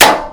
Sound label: metal box impact bullet; physics